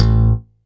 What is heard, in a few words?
pluck bass A, single shots; Sonic Foundry (Sony Creative Software); Ugly Remnants VOL-2; Basstuff